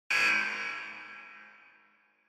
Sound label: Electromagnetic Buzzer Hall